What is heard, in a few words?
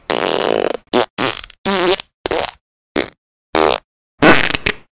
fart; cabbage